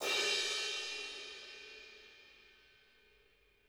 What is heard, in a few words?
CRSH, CRASHES